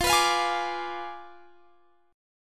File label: F Diminished 7th F down Guitar Drop D